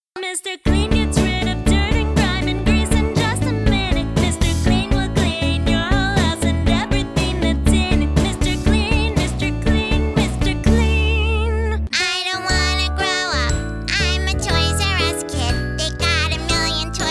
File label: jingles